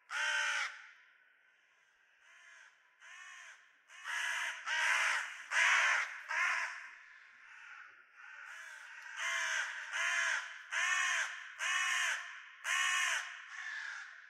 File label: crow; minecraft; Remastered; ambient